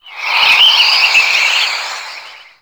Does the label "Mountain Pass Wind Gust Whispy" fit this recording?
Yes